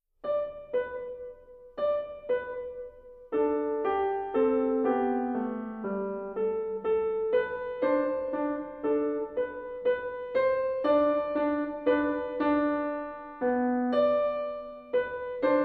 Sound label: The Cuckoo Solo